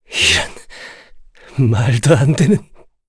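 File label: Vox Dead, Korean; Kain; kingsraid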